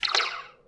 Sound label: alien shoot; Space Shoot